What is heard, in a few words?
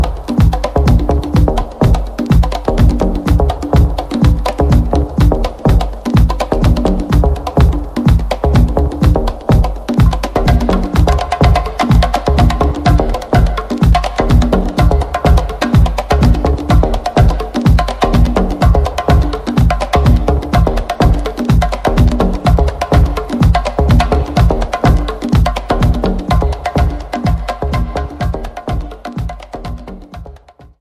Soul Drum